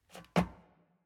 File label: Footstep Metal Rotate; Silco